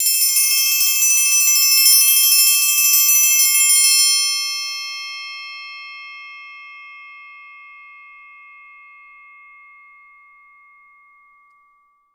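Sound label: TRI TRM LO, triangle tremolo low; Best Service; Peter Siedlaczek's Advanced Orchestra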